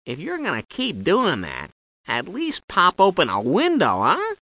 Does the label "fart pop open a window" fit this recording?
Yes